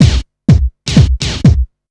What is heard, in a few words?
drum loop 125, fxdrops; house; PS-28 Vocal House Samples; drumloops